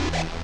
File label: Short Horns